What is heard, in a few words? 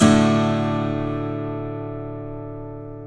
acoustic guitar chords